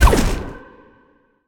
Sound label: Bow Fire; smite; god cupid skin13